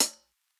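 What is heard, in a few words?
closed hi-hat; eddyflux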